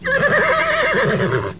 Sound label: Horse Whinnying